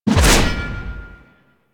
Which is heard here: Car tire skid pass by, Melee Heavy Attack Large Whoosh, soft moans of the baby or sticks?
Melee Heavy Attack Large Whoosh